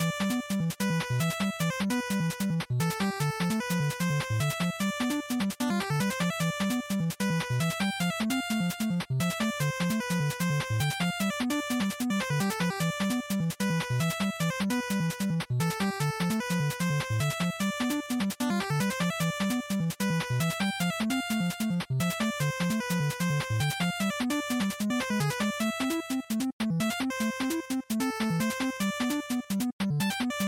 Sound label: NES Racing Stage Loop150